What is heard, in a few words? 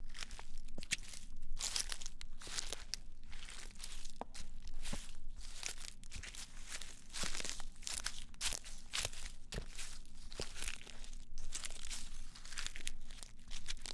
Footsteps on leaves